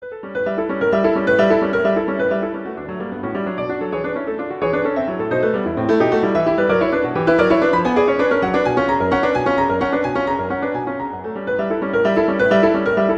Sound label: in D major, Allegro molto; Chopin; Preludes